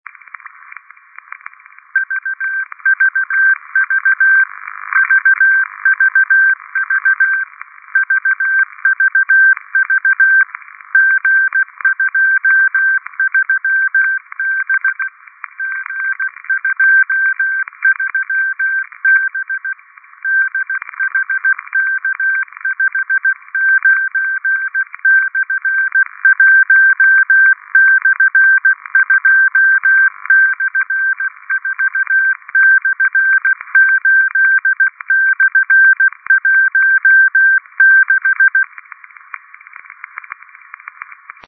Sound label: cw